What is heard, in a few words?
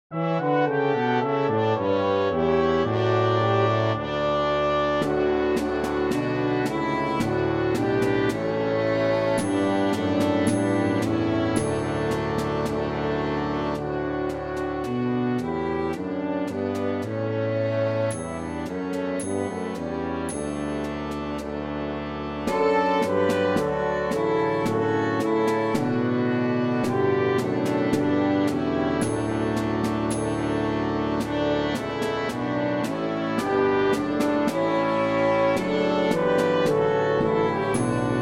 Violin track with Melody; Good King Wenceslas